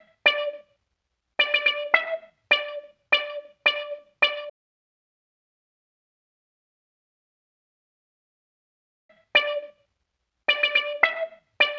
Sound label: Wah Synth